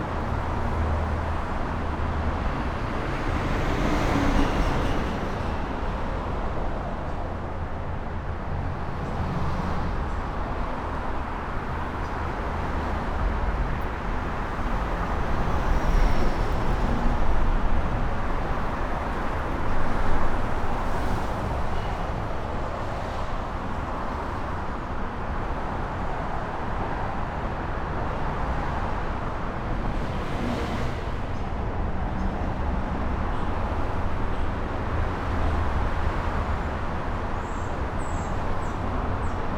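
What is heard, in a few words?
Urban traffic moderate busy; urban and suburban; boom library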